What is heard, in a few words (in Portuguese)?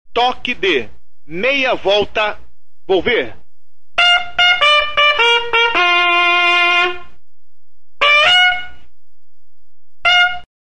Meia Volta volver, toques de corneta